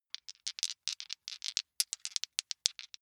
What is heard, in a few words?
big two rattle in hand